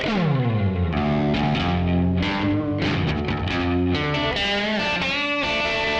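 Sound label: big guitar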